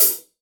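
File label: open hi-hat, Real Drum Kit, ROCK KIT; Best Service ProSamples vol.43 - Real Drum Kits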